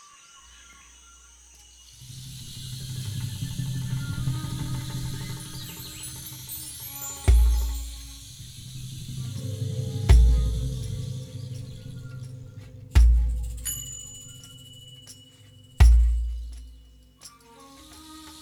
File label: downbeat